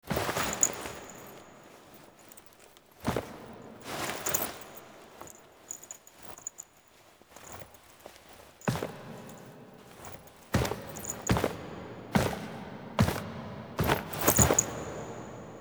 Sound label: Foley B; Warframe; Quests; The New War; Sealab; Hunhows Lair; Drifter